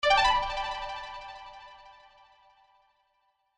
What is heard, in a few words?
jingle; mikamusic